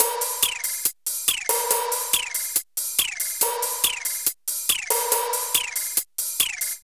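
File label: drum loops, Left; Big Fish Audio; Prosonus 500 Drum Loops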